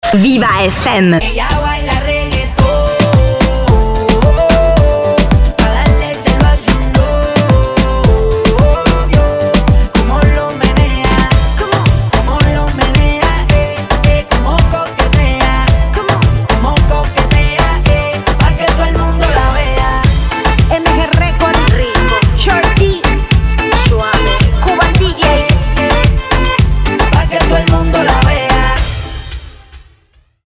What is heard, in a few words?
Reggaeton